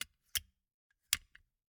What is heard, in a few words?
Gumball machine, candy door, plastic, open, shut, hyper; Eiravaein Works; Vaeyan IV